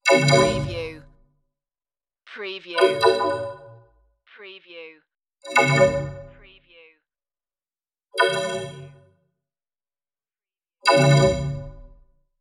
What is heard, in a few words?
system popup notifications